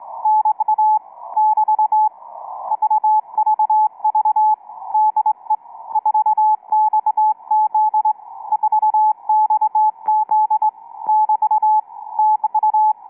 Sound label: websdr 6607kHz